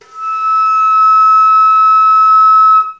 F6 alt baroque, flute

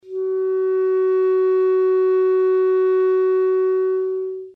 G sharp 4, clarinet

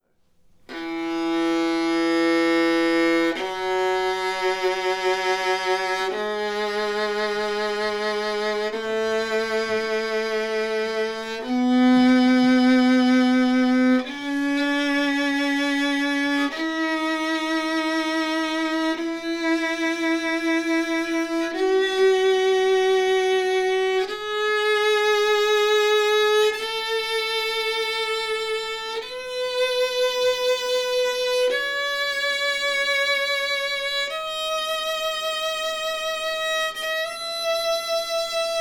Scale No Bassbar

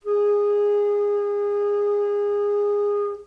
A4 baroque, flute

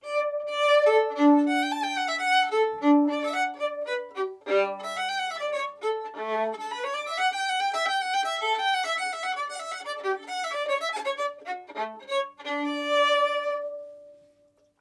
Bach Baroque Bow Gut

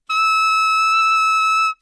F sharp 7, tenor saxophone

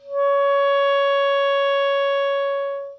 D sharp 5, clarinet

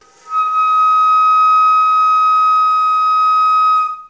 E6, baroque flute

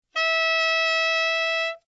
F sharp 6 alt, tenor saxophone

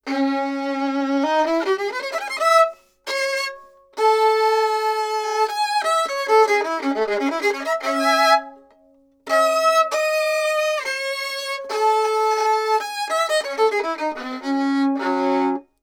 Baroque Bow Gut Shoulder Rest

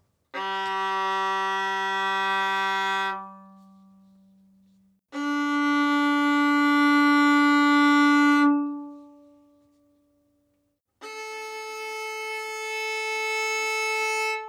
Open Strings Modern Bow Gut

